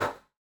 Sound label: Aircrafts L-39 Seat Adjustment Down End; DCS